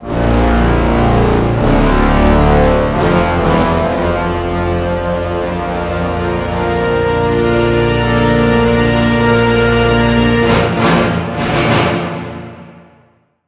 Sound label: Epic Brass Shorts Music, brass ensemble; zerog